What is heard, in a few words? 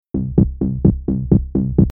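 140 explosion bass fx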